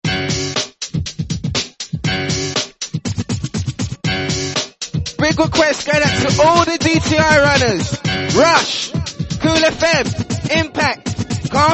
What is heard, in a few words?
Noise Factory